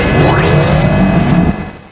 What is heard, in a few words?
Maximize Restore Up